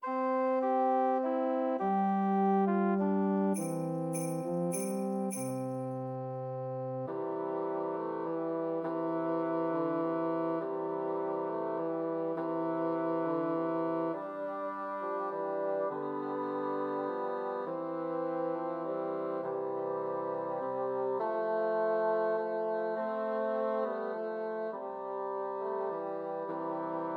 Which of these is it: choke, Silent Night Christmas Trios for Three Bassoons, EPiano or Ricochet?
Silent Night Christmas Trios for Three Bassoons